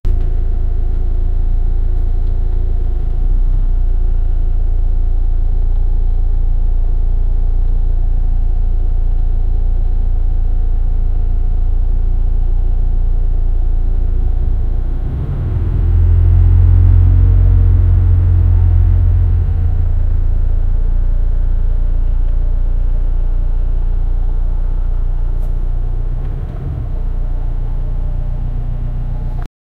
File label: ambient sounds of riding